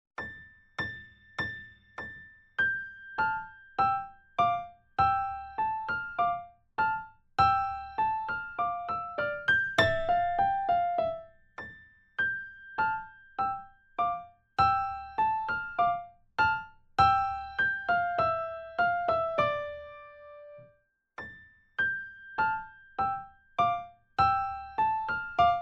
Primo, Vierhaendige Uebungsstuecke; Diabelli

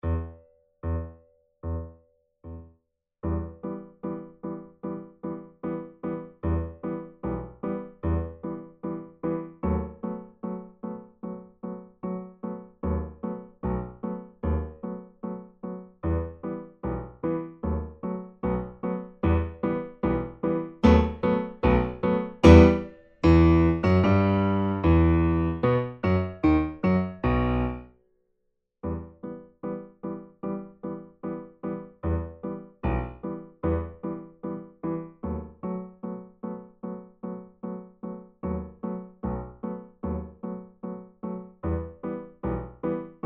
Secondo, Vierhaendige Uebungsstuecke; Diabelli

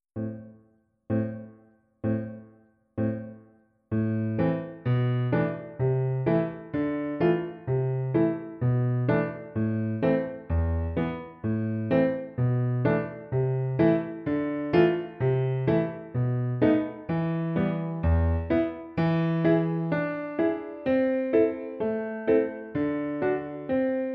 Arabisches Lied T64 Secondo

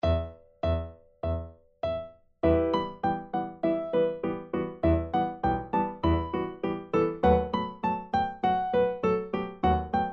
beide, Vierhaendige Uebungsstuecke; Playbacks; Diabelli

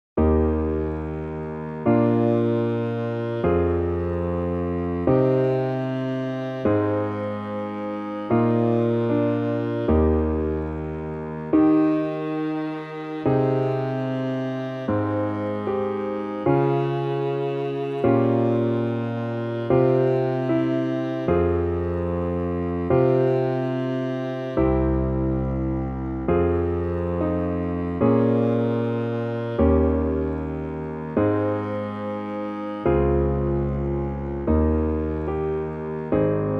Andante Presto schnell ohne Sax; Playbacks; Bach Johann Sebastian; Sonate 4 BWV1033

